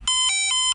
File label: Alarm tone